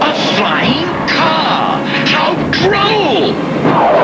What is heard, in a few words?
Flying car